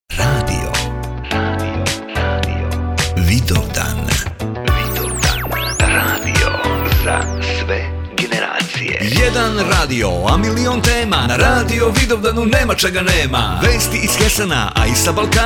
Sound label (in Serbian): RADIO Himna Promo Song; VIDOVDAN